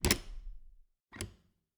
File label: Refridgerator, old fashioned, wooden, steel, brass clasps, hinges, close open, wide; 2 mics; Eiravaein Works; Vaeyan IV